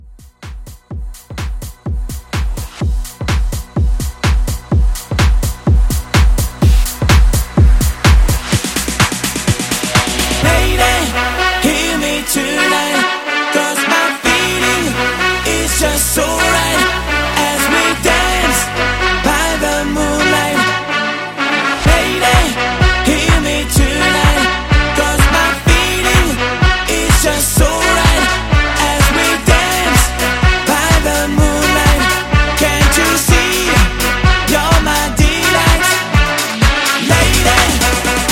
Hybrid Mashup 126

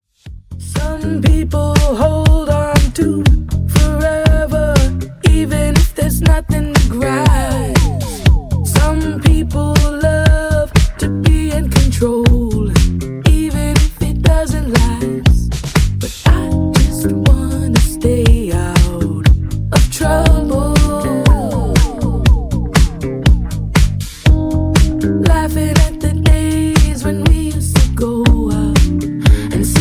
Dj Intro Outro 120bpm; remixplanet

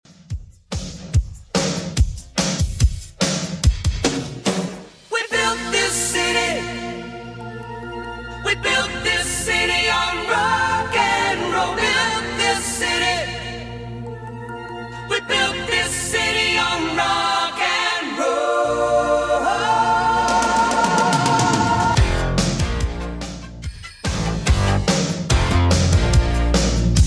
80s Redrum 144bpm

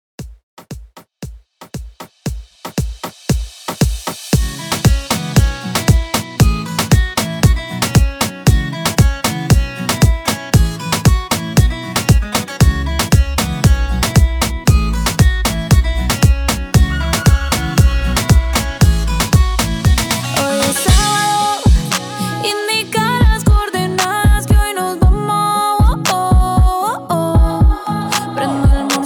REGGAE REDRUM 116 BPM

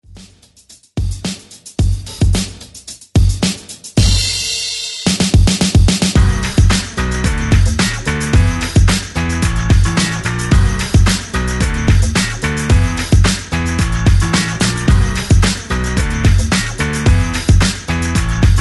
90s ReDrum 110 Bpm; DJDOLLS

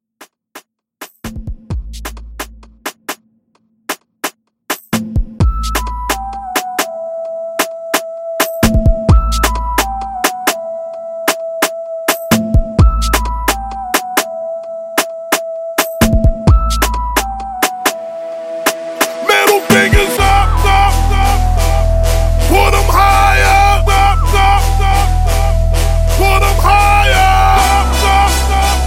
Dubstep Trap Rework; Remixfreakz

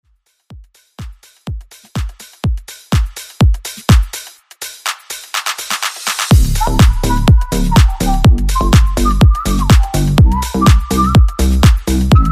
Remix

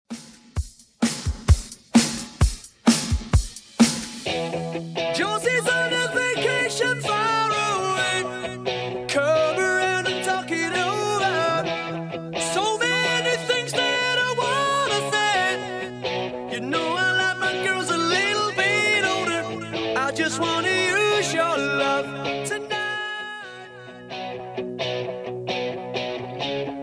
80s Redrum 130bpm

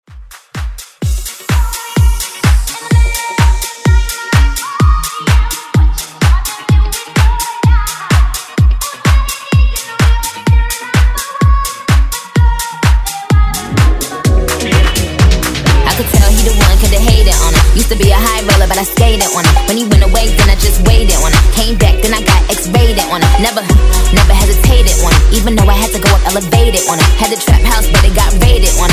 Dance Redrum

